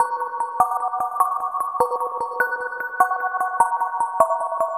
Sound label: Shimmer Mallet